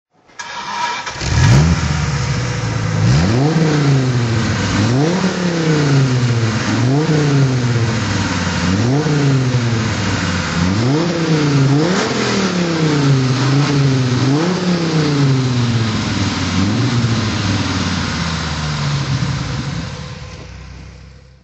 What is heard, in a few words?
bristol fighter throttle